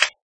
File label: slime; mob; Minecraft